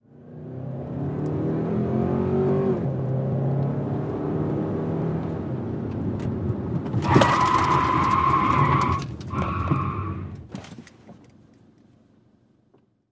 Sedan Accel Int Skd

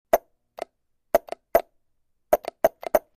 Solenoid Small Vacu, buzz

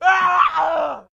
Death Screams 6; 10 Male Screams, Various; battle

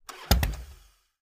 harley start stall, harley-davidson

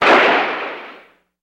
Car Crash Shrt Sharp, car crashes

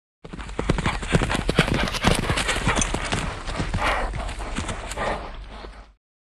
Horses In Two Horses

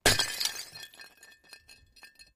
Ceramic Breaks; clay & ceramic

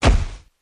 Camera flash, flash pot, good poof; cameras

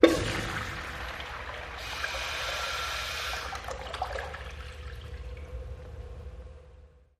Flush Reverberant Pu, toilet

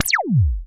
laser; lasers

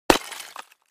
Clay Chimney Vent Hit, concrete & brick

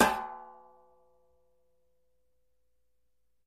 Metal Hit Ring Soft, small impacts

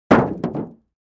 Car Roof Impact

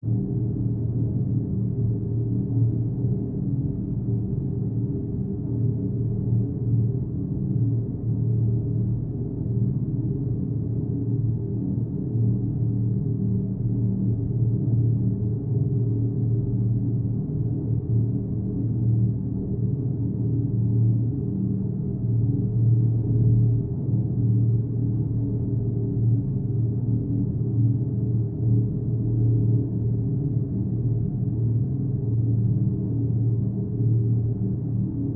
Ambiance Metal Fan, industrial ambience

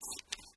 scissors cut paper

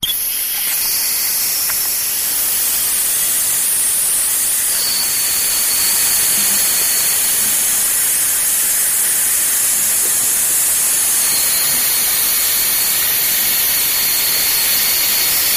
Water Steam Watery

